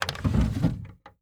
Dresser Drawer Open; Doors & Drawers

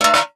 Metal Lid

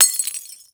Glass Break, Crashes

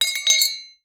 Metal Clang

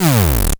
Radio Hit Descent; Transitional Elements; 1001 Sound Effects

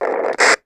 Walkie Talkie Static; Communications

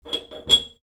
Candy Dish Lid, Kitchen

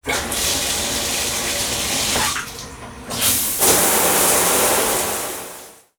Shower On; Bathroom

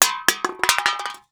Soda Can Fall

